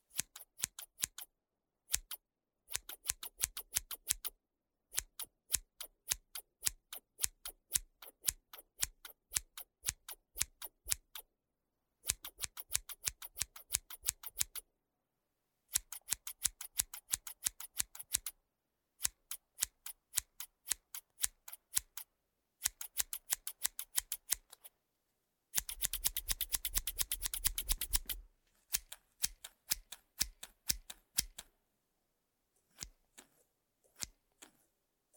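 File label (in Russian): металлические ножницы для разной скорости резки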